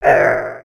Attack; Skullkin; creatures organic

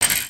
chain; creatures organic

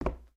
Foot Wood Boot Walk, footsteps

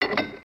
Chest Idle

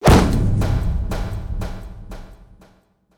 Pet Cat Knock Back; creatures pets

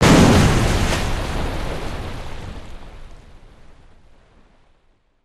water splash distant; destructibles

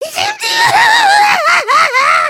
panic, emotes

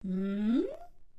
Hmmm, creatures organic

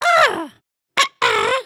robot dance, emotes

